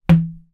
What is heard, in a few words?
sport tennis ball hit window